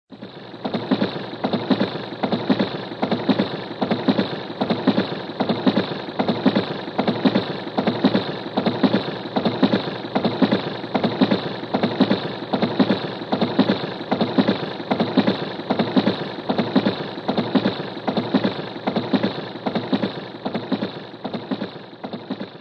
Train Passes, Sound effect of a locomotive train passing by.; Train Sound Effects; Vehicles